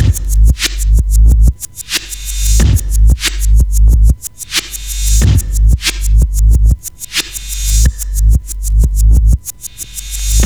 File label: beat, 4 bars, 92bpm